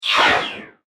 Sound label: Intense Futuristic Laser Bolt, laser gun sound effects